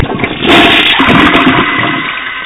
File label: flush